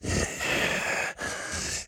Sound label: Walk Cycle Burns; The Town